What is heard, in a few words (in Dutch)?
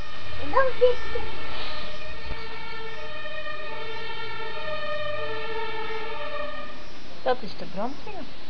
sirene